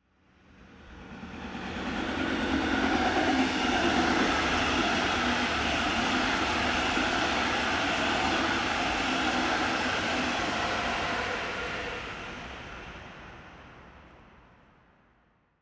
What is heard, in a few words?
train regional train long passing